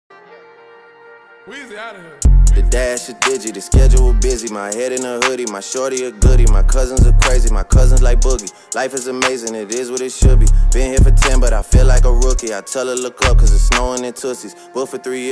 hindi rap ringtone

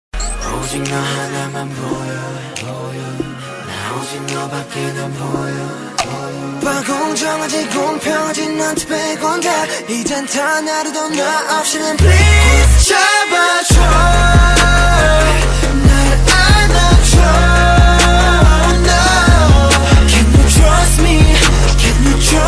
korean ringtone

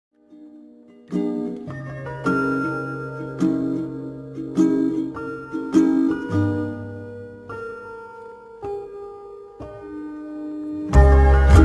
BGM english ringtone

